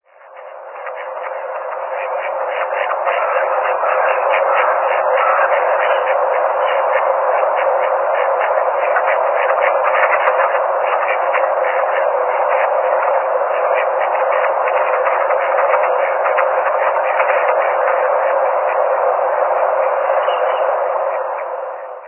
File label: magamo, singing